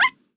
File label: combo break; osu